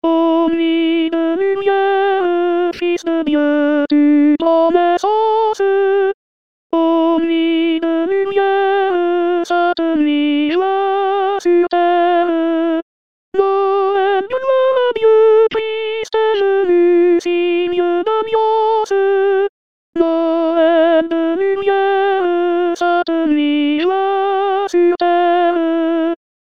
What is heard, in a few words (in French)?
O nuit de lumiere Soprano, Chorale